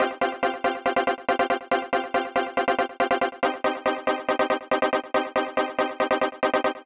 Arp

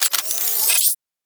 Additional Weapon Sounds

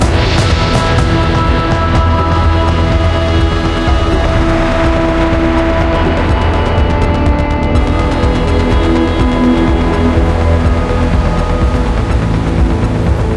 victory loop

